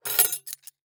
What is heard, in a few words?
Household Silverware Pick Up Off Wood Table